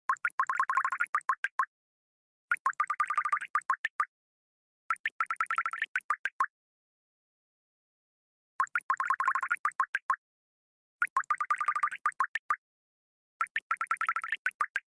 Water leak notification; شیائومی

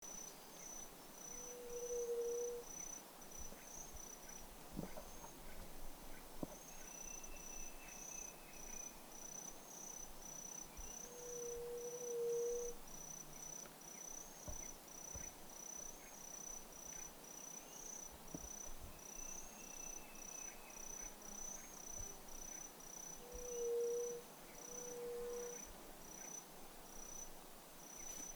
White tipped dove, قمری ورو

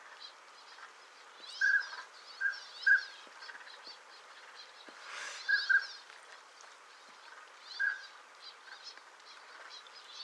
Northern pintail

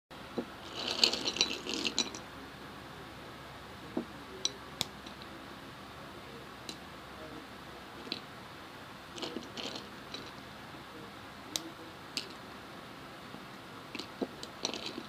Open pistachios

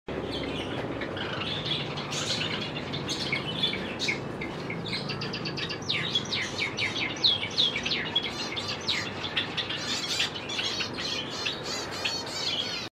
Java sparrow

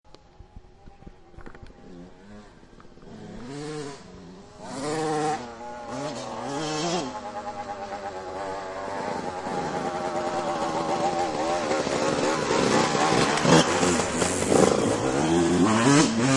motocrossers

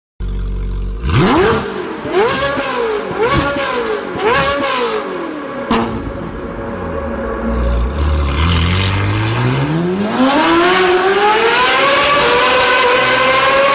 Car Acceleration

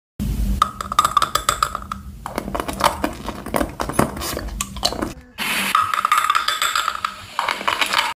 Candy, خوردن آبنبات

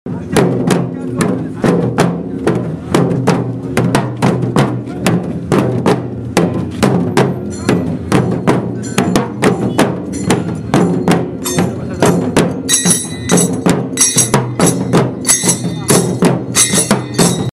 sample moharam

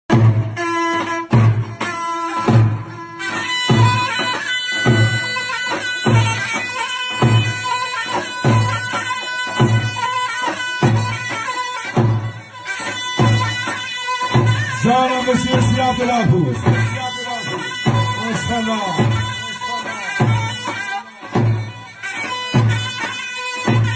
saz hakhamaneshi, بختیاری; ساز هخامنشی

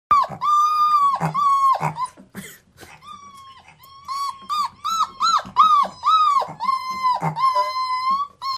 Papi dog; سگ پاپی

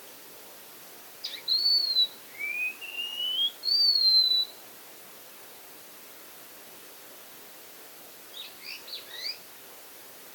Wallcreeper

